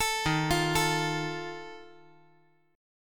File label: D sharp Suspended 2nd Flat 5th, chord; arpeggiated; JGuitar